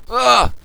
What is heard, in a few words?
burn